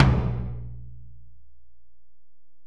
Tom, Alesis DM-PRO

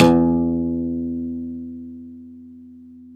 UD E 2; SoundBytes; Early Patches

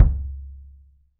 KIDM, 3D Drums, MAL KICK

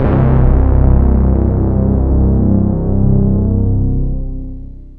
PPG bass C2; Zero-G Ambient 1; PPG BASSES

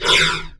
BREATH, HEAVY BREATH; Q Up Arts; Denny Jaeger Private Collection

